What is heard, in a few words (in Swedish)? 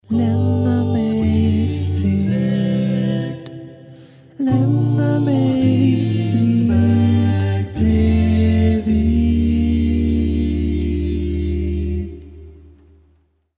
musik